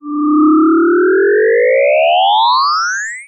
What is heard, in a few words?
cartoon grow increase size; zapsplat